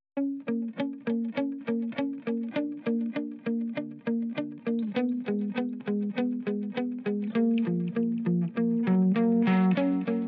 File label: Guitars